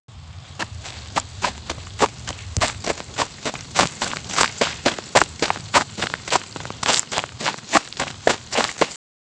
footsteps

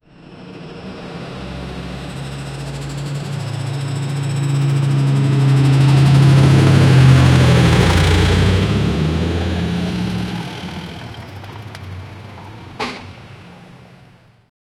tractor approaches breaks; brakes

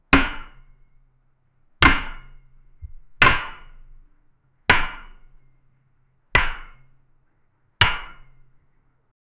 a loud clean crack of, baseball

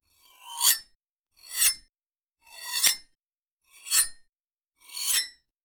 a slow smooth satisfying, blade

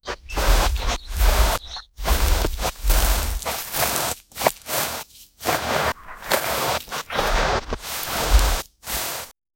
realistic sound of a velo, ambient wind

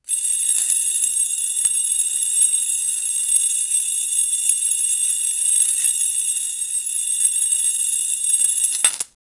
casino

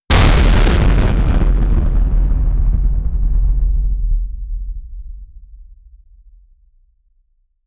nuclear explosion, bass boosted

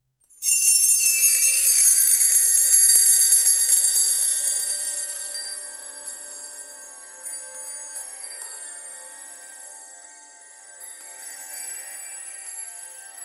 fairy chimes magic; musical

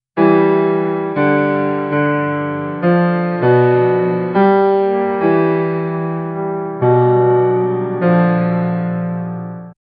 historical piano like; classical

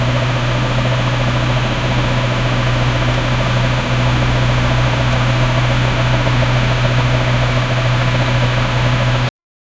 boat engine hums to life

